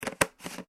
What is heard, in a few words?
household tub plastic lid on; Plastic Lid Placed / Screwed On Tub; Kitchen; Plastic Lid Placed On Tub 2; McKinney Sound